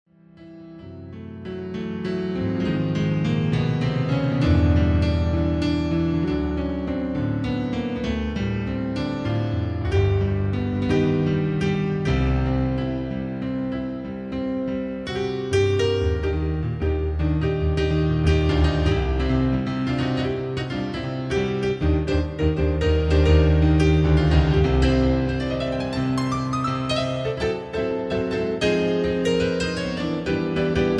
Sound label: (piano solo)